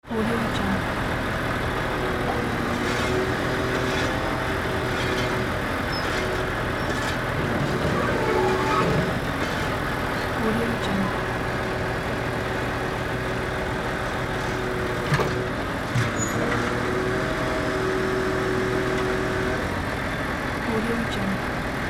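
construction site truck engine shoveling and digging; دستگاه حفاری; industrial sounds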